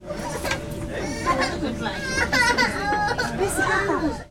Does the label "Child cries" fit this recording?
Yes